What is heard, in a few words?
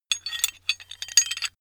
metal handling pieces